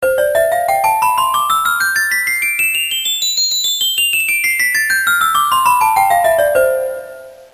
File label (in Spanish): carrillon